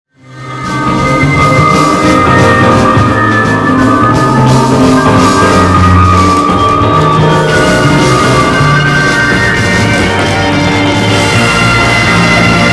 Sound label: solo